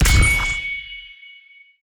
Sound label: skill brighten sword born